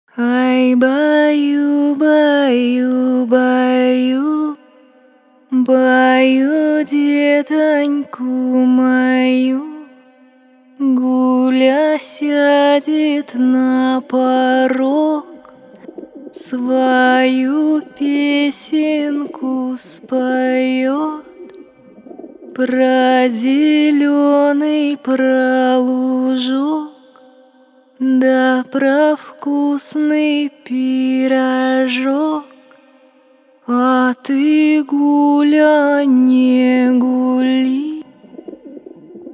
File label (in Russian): Русские народные колыбельные, Гуля сядет на порог